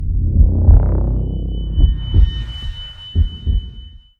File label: echo